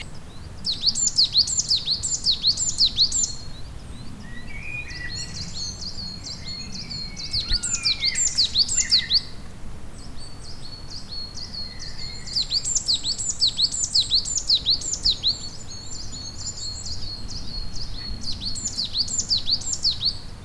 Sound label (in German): Tannenmeise; Elmau GAP; Michle Peron